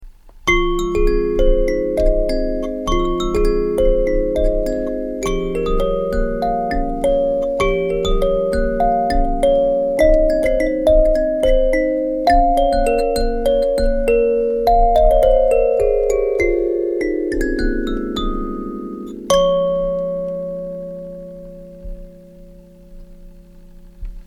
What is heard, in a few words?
15-tone F minor 2 rows kalimba